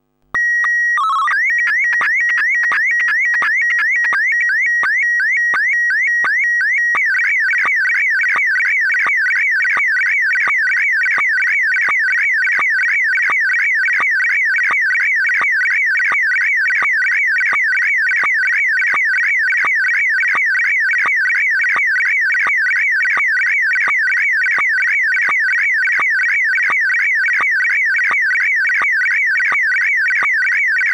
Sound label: HQ1 bars, SSTV Signals; hamradio